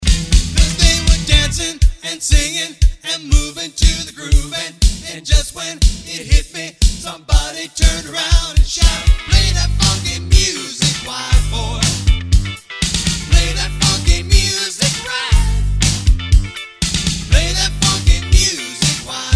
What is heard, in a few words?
Play funky music